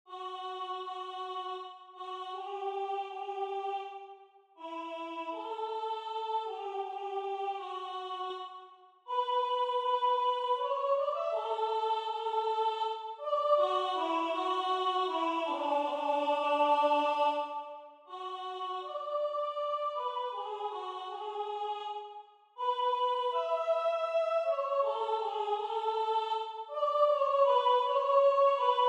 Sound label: Soprano